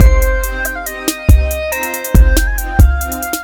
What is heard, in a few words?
victory music; smite; kuzenbo